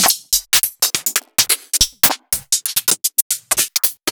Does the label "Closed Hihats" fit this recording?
Yes